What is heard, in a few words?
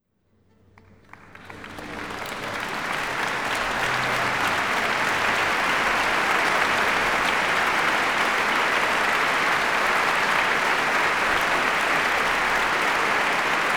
Applause; Wolfgang Amadeus Mozart; Symphony No. 40 in G minor, k.550; Sergiu Celibidache